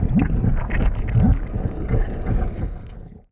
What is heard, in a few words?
Lava